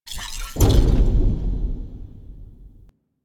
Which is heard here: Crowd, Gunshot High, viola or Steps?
Steps